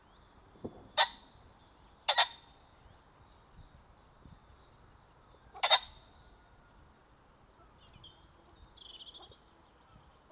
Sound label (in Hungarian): facan Phasianus colchicus